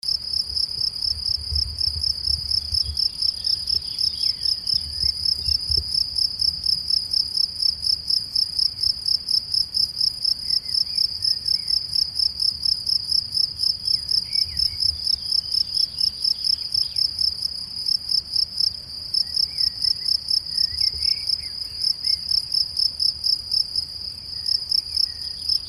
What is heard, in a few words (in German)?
Grillen Gesang Wiese